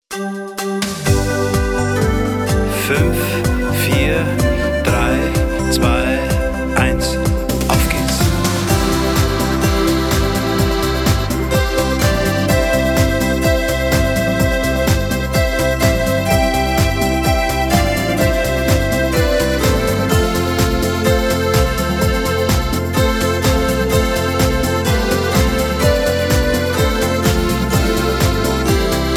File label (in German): Verwehrter Glockenklang